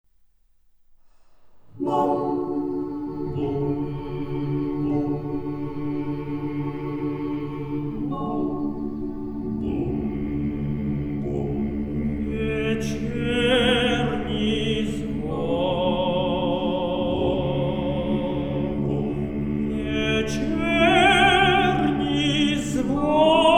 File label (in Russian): Хор